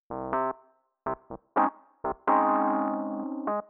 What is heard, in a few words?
125 Rhodes C; Keys; PowerFX - Nu Jazz House